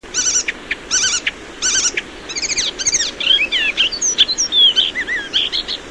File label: Hippolais icterina song